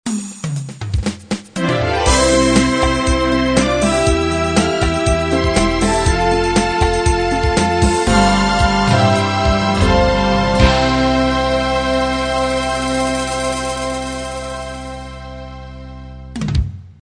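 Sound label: unplugged 8 beat end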